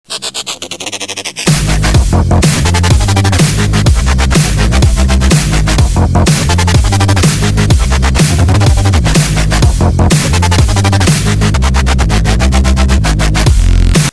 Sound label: zm dnb